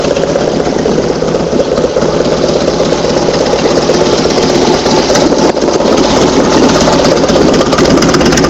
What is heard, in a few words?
Harley Davidson